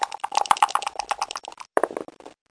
am thanh tieng lac xuc xac

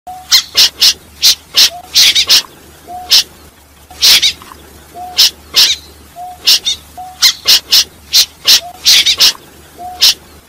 tiếng rế giun

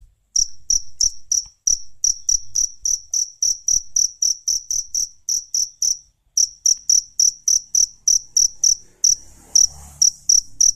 tieng de keu goi ban tinh

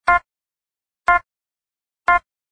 hieu ung am thanh dem nguoc 3 giay

